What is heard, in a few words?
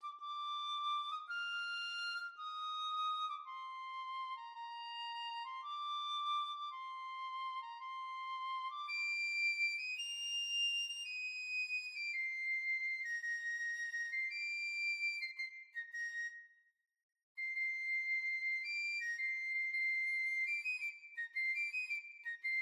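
piccolo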